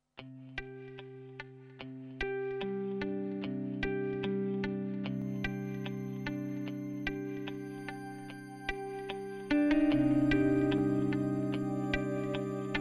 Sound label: instrumental